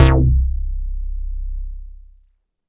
Moog bass, synths

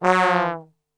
F#1 TRB, Brass Super Section; Best Service; 90 s Sample CDs

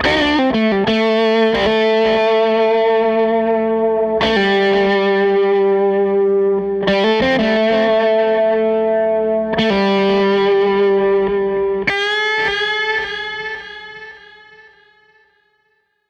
Strat Guitar Loop

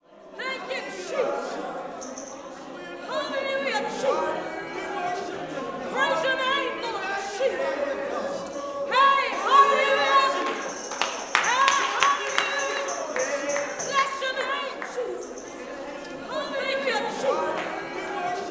WORSHIP, WORSHIPAMB, Vocal Planet, Gospel

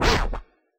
SCR, Yamaha DJX, B900 Kit

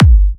G, Kicks; markus hakala

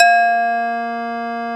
LOG, VS LogPad

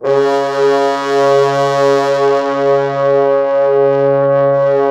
C#2, Orchestral Brass

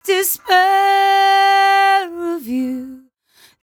57bpm Am, Velvet Vocal; Zero-G

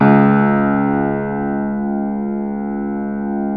C#1 R, Keyboards Acoustic; USB Soundscan; AKAI

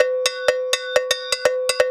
Percussion Loops, Brazilian; Houseworx

